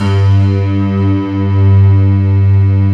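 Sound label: stacked G2, piano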